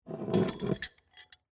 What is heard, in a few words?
Skeleton Key Up, Pick; Foley; Jangling; BlastWave